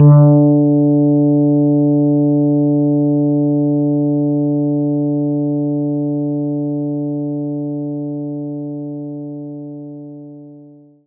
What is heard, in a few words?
Fretless Bass D3; knights try